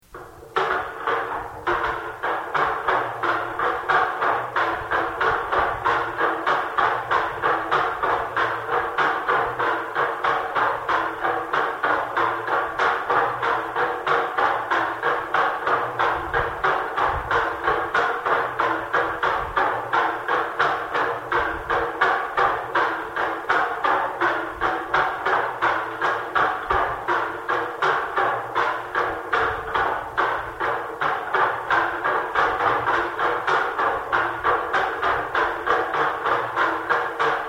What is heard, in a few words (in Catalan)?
campana